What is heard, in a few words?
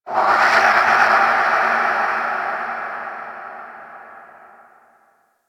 Ocean Underwater Creatures Small; Ambience; Warframe; Grineer Ocean